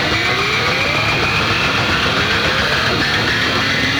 cyberdemon 180bpm loop

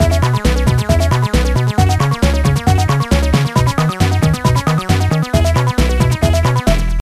Level Complete; Zapper: One Wicked Cricket; GBA

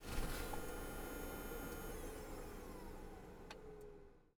vehicle small turnoff; teardown; ps5 windows xbox series xs

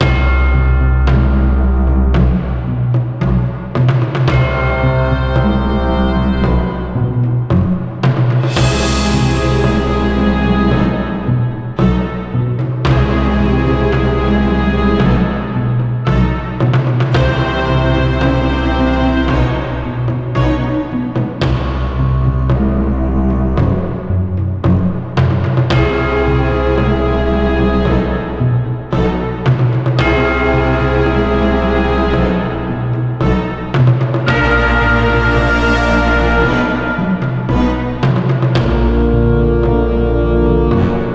Hydra Suspense

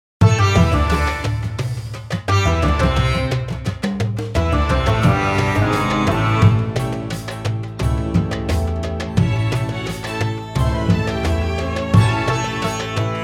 Sitar Version; American Arcadia Original Game Soundtrack

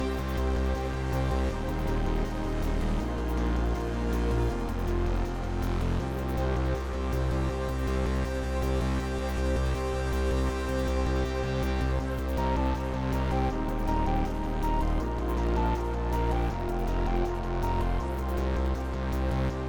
Exploration Bonus 8CH 80BPM

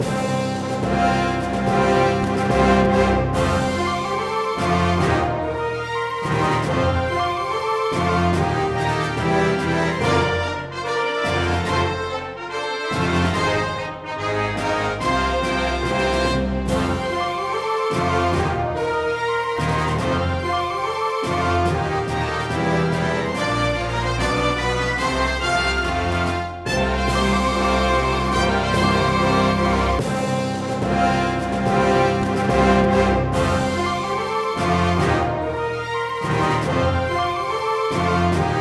Hammer Launch; Toy Story Mania

